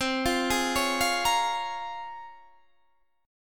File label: C sharp Major 13th, chord